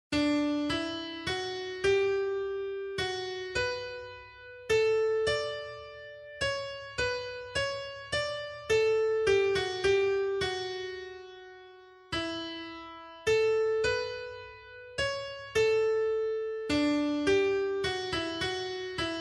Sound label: CHORUS ANGELORUM